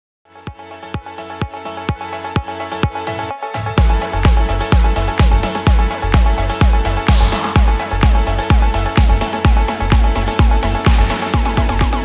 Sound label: Progressive; PROGRESSIVE CUT EDIT